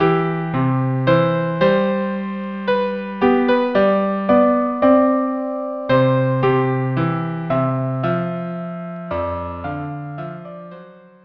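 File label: violin cello duo; que dattraits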